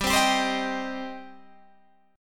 G Suspended 4th Sharp 5th G, down, Guitar, Standard; Gsus4#5 strummed; JGuitar